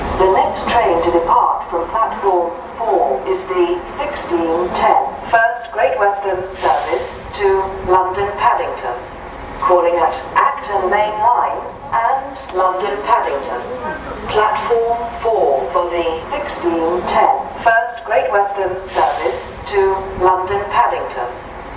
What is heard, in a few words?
Pad; London